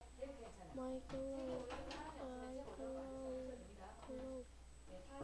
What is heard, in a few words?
microwave